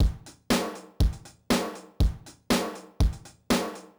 drum; DSM

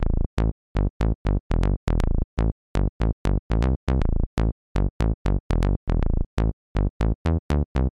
Arturia V3

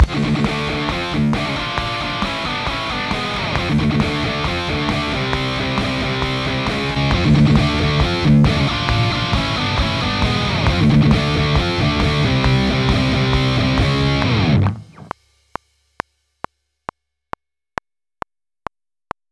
Guitar

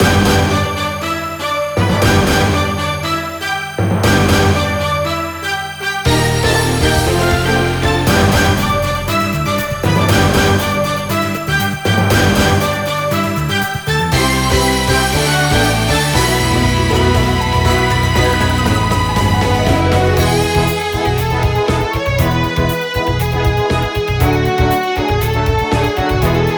liven up

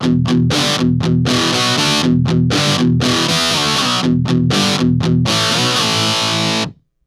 Guitar S57

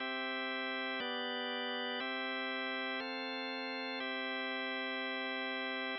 organ strings

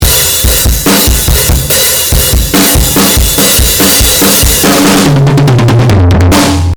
Synth Warmer DRIVE

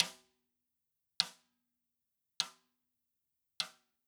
Snare Rim click

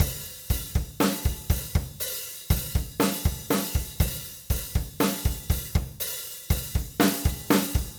drum

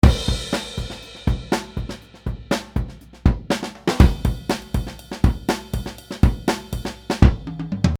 DRUMS

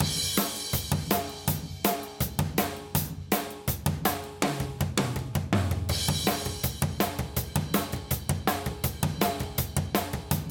wet

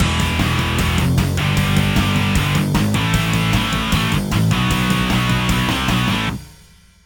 guitar on; iZotope Ozone Imager

